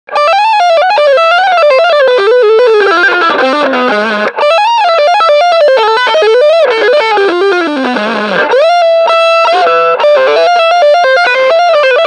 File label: goes neo classical